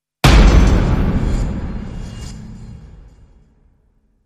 Main Hit

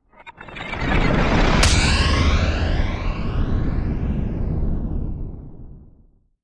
Rattle And Big Impact Sweep

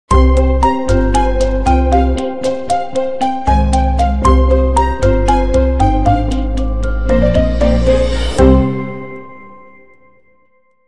Long Sting

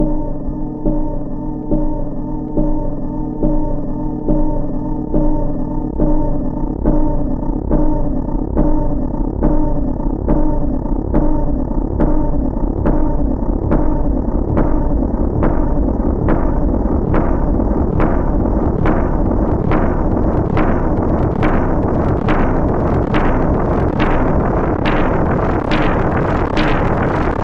Pulse Prey

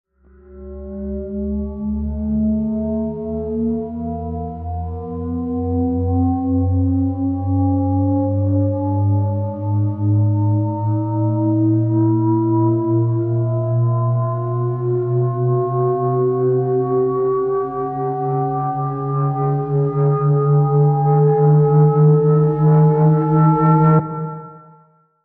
Quantum Leap Rise

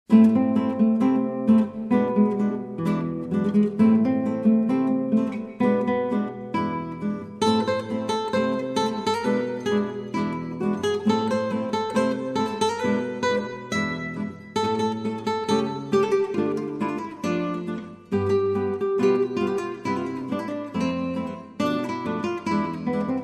Tango

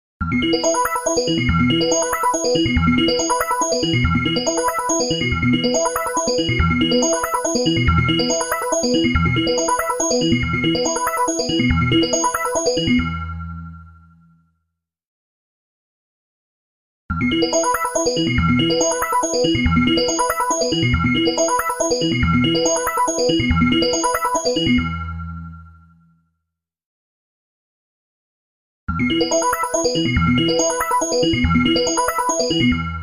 Jazz Tech

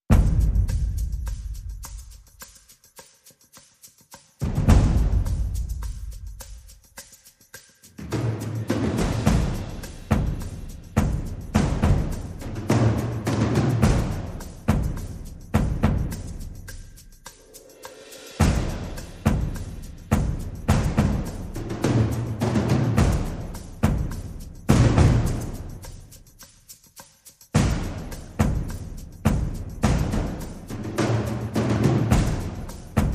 Perc Only

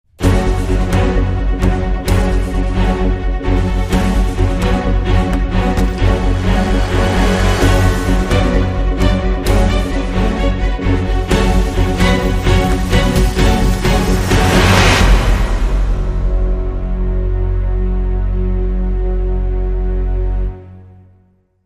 Dramatic Sting

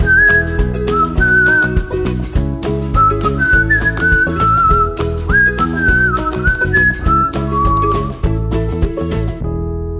Intro Whistling

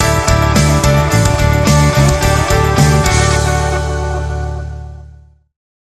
Power Hitter Sting

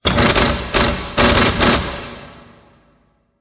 Door Lock

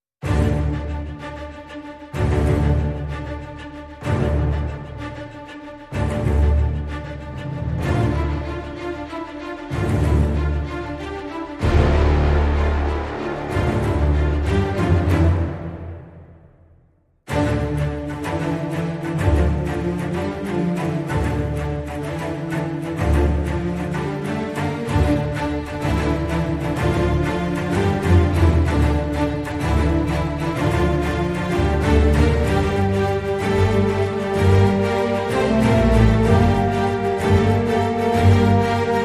No Perc

